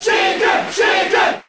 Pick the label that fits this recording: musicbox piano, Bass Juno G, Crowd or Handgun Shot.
Crowd